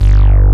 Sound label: Micro Moog G0; Digital Sound Factory Xtreme Lead